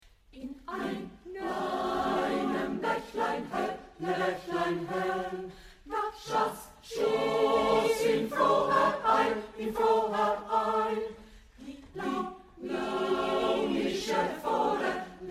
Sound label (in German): forelle Chor; forelle liszt